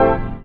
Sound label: CHORDS; EastWest; Dance Mega Hip Hop Electro